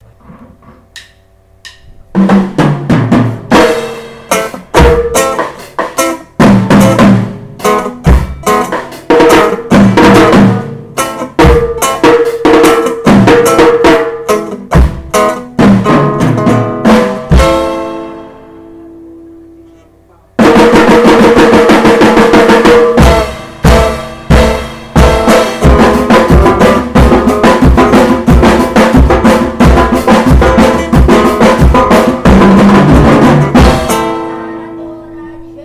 ensaio

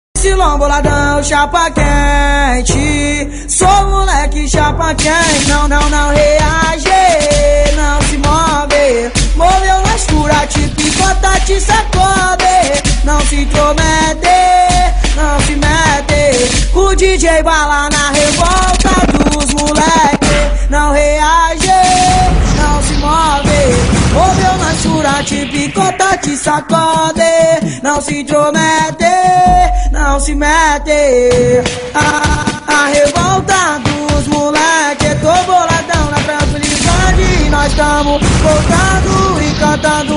funk batidas; chapa quente

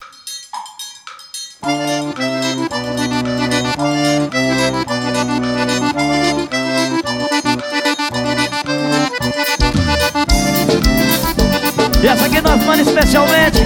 forro solado

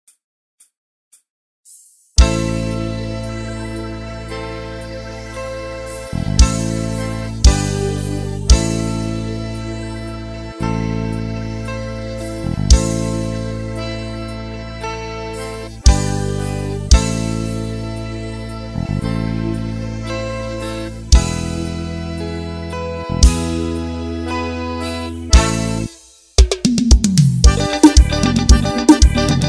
teclado

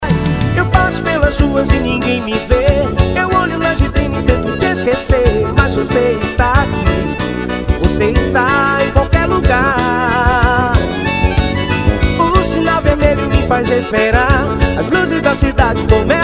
forro ao vivo

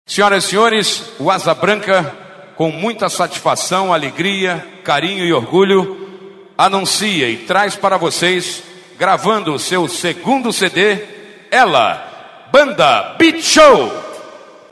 abertura; forro beach show

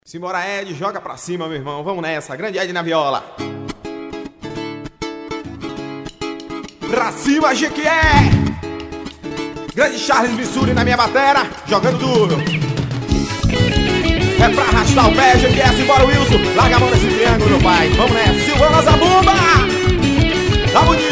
xoteando, frevo

